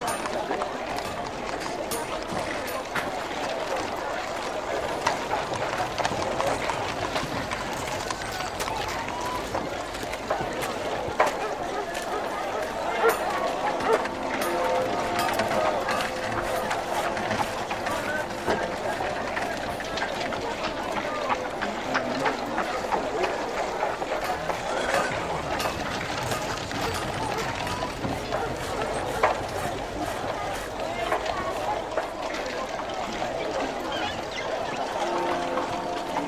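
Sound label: City Medieval Small; Civilization V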